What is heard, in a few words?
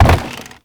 land wood; Movement; Warframe; Uriel